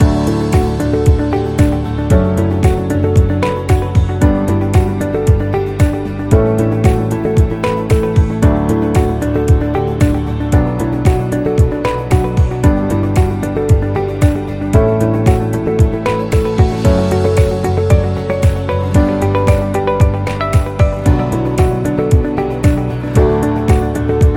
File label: Corporate Loop Presentation